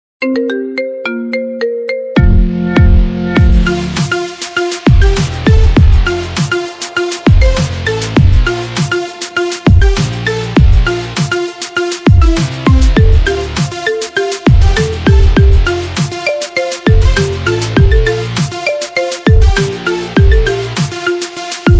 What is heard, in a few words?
marimba remix marimba ringtone